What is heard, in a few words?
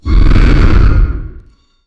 deep guttural demonic dea; warrior